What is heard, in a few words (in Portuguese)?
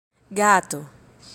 gato